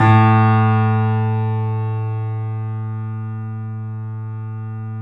3D Pianos, Yama Medium; E-MU Producer Series Vol. 5; 3-D Audio Collection

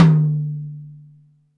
Tom Hi, Alesis DM5; Drums

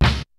kicks snare, kid; Kid Nepro; Elite; KICKS SNARES

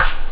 hihat; ST-XX Amiga Soundtracker Sample Packs

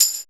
PER TA, Percussion Tambourine

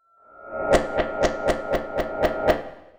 Heavy Water Beat, Electronic Beats; Sonic Foundry (Sony Creative Software); Myths of Technology

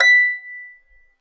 B6 HARP; USB Soundscan vol.62; AKAI

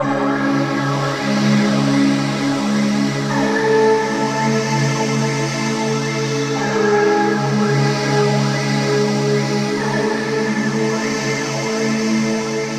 Pad Oceanic, Drum & Bass Pads; Sonic Foundry (Sony Creative Software); Knutrix Drum & Bass

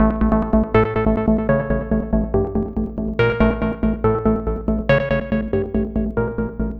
Lead

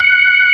MID FAST, ToneWheel Organ

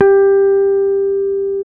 Basses, Roland

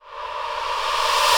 noise fx; Masterbits; Switch FX

